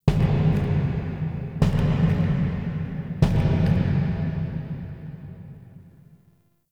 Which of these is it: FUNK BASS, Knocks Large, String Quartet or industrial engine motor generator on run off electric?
Knocks Large